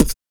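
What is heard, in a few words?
vox percussion, Vocal Planet Jazz FX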